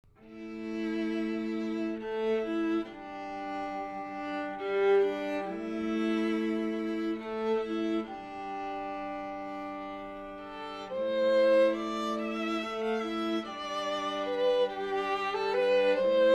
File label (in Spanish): Tradicional celta Greensleeves